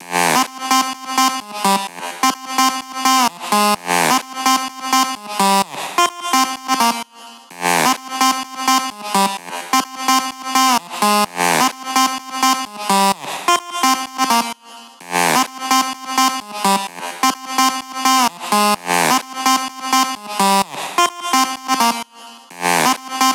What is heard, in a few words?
128 BPM lead Synth Add Wet SC, G; Vengeance; Essential Clubsounds Vol.5; Demo Constr Kits; Sidewalk Kit